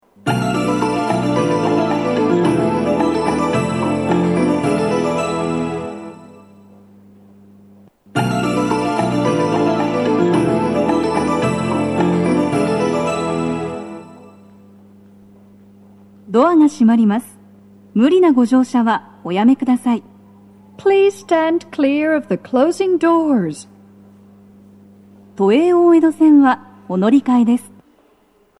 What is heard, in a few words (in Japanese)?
発車サイン音, BOSE天井型での収録です。; 1番線 西船橋・東葉勝田台・津田沼方面; 門前仲町